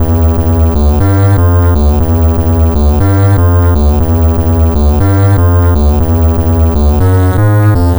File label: Apple II Bass, Chiptune